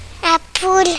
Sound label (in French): poule